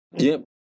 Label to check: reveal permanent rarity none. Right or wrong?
Wrong